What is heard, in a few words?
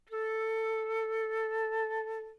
Flauta A4; robowiki